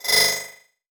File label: Multimedia Internet CD-Rom Flash Whoosh